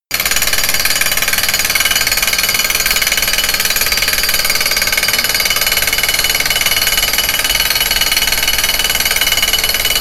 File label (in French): marteau piqueur